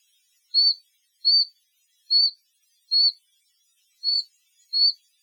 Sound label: call; Iran; Elborz; Iran2017; Elborz1500m